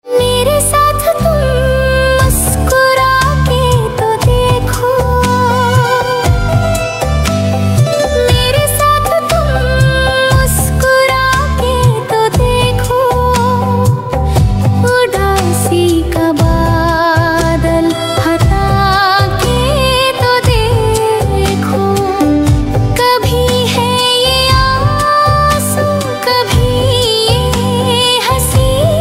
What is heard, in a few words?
AI Female Ringtone